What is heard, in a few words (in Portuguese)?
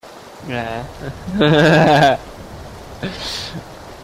risada